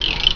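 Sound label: ratchet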